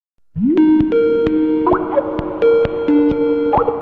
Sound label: skype ringtones; sms